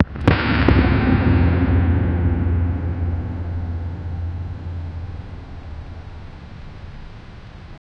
low booming building coll; metal bending

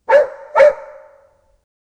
low echoing dog bark

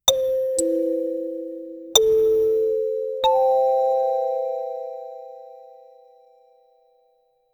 modern profess, financial

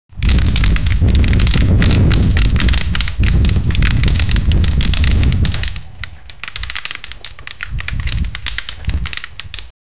raging fire crackling and

